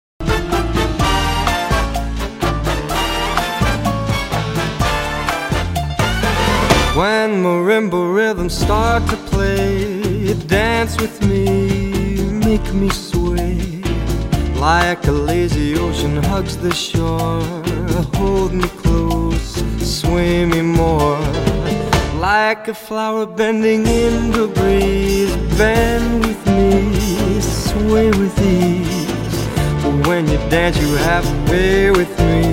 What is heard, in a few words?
Alternate Cha Cha